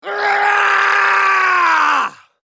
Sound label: male generic warcry